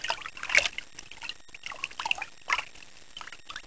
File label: quiet Water; The Fishin' Hole v1.0